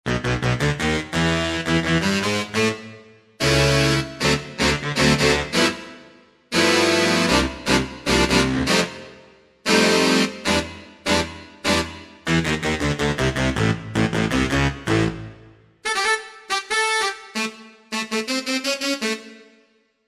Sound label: Saxophonia, Korg TR-Rack